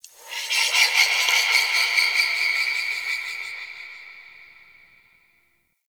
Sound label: Sentient Texture Small A; Warframe; Sentient Lair